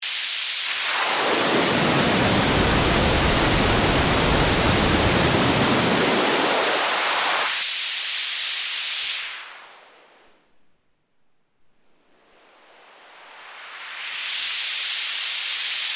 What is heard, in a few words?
High Low Pass White Noise; GreatRiver; Harrison32